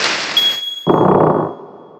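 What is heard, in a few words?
dungeon key open; Etrian Odyssey I HD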